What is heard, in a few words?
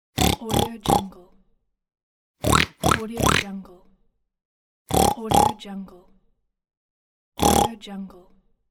cartoon pig snort